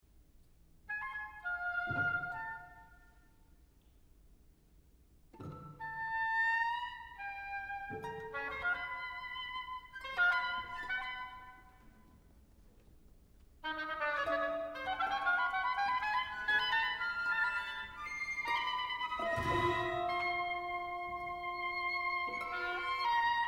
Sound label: oboe concerto